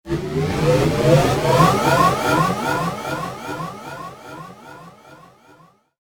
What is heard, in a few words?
Power Up